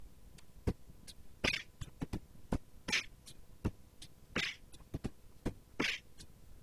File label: rap, beatbox